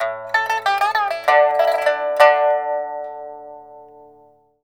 PIPA PHRASES; Spectrasonics; Heart of Asia

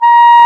WND CLA-E, Clarinet Sh; Roland LCDP04 Orchestral Winds; 90 s Sample CDs

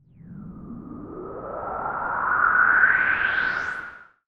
Virus Noise

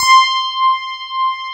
FM ELP C5 R, Keyboards Old School; USB Soundscan vol.09; AKAI; FM ELP 6

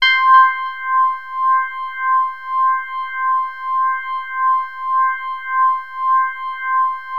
C5, Korg MS-2000; Synthworx

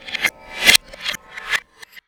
Finger In Reverse, Prepared Kit; Battery 3 Sample Kits

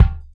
KICK; Funky Ass Loops; East West

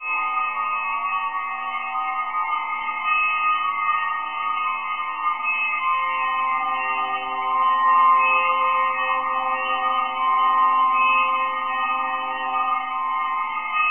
BELL ATMOS; Zero G; Malice In Wonderland

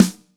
Snare M, Standard Kit, Yamaha PSR-E323

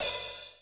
OPHH, DRUMS; Crypton - DrumBase5000; T1 DRUMS

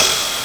TR707, Nintendo Gameboy LSDJ

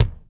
JAZZ KICK; Greytsounds VOL-1

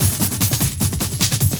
Beat, Industrial

